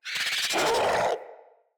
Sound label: Infested Charger Alert